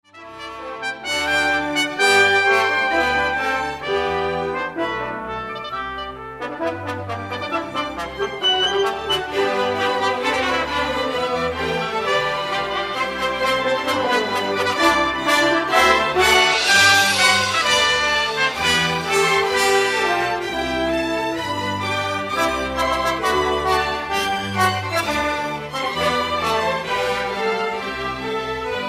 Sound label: orch